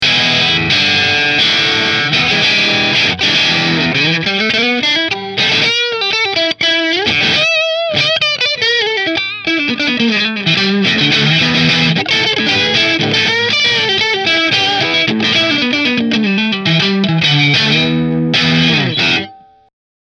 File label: Rahan Ambrosia Position 3 Marshall, guitar; Rahan Guitars RP Single Cutaway Ambrosia